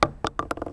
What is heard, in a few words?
wood; Mafie 1